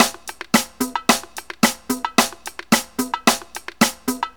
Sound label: drum break 110 bpm; Drum-Breaks; Drum-Breaks-Collection